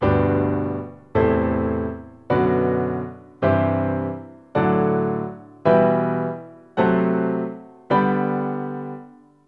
G Major diatonic chords 2nd inversion

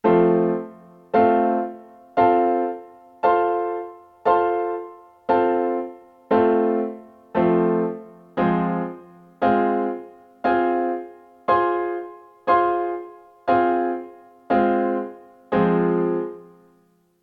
triad and inversion block chord in F and Fm

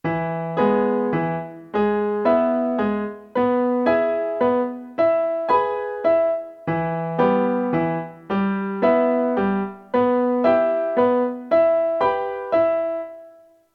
triad and inversion rhythm in E and Em

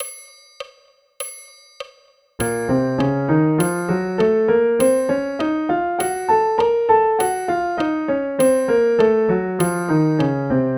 Bb minor scale and arpeggio; bastien

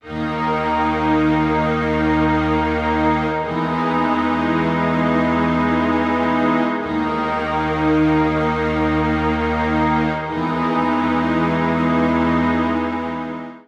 strings

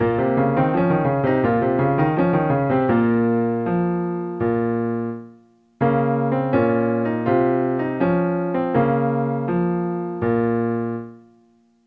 dotted 8th note in B flat m

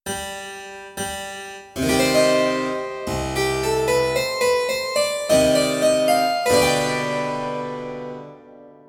harpsichord; tameshi

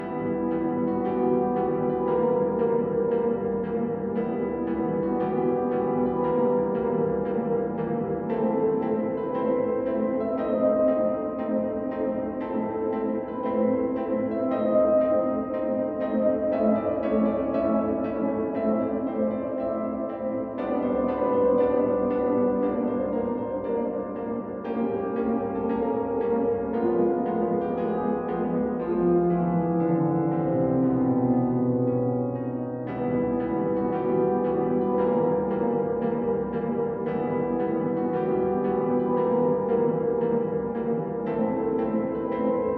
spooky house reverb; reverb mashimashi

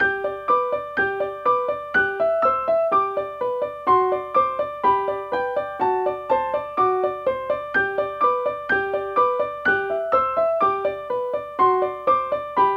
transpose swiss music box in G Major

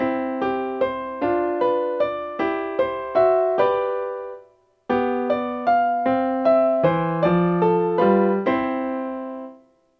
syncopation; Gurlitt 33

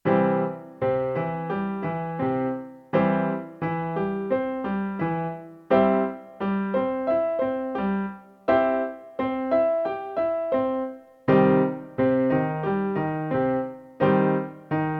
C and Cm

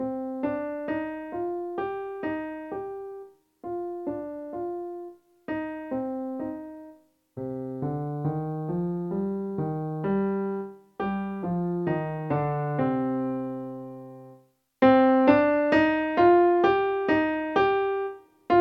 practice; Santas Sleigh

